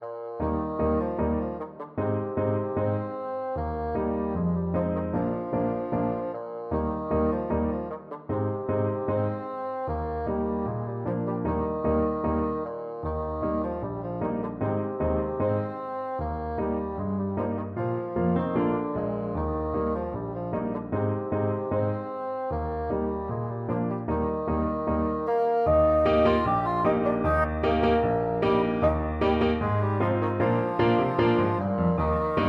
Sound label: bassoon